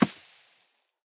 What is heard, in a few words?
Quick Sand Bounce; doodle jump 2; android